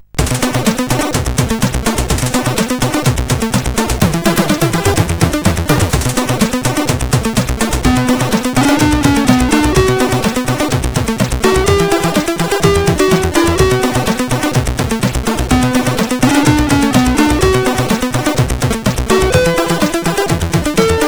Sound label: Game Intro, sid CSG8580R5